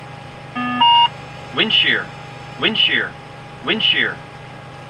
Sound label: airbus windshear